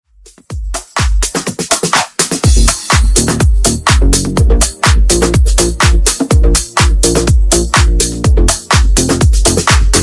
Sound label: funk, 124 bpm